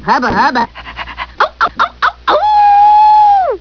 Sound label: 10 kisses